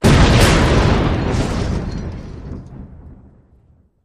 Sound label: Explosion Metal Deb, metal & glass